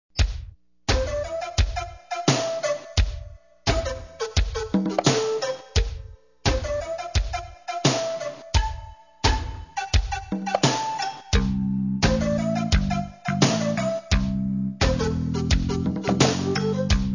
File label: World Beat